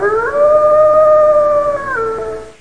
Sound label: cheesy lofi werewolf; Zoo and Nature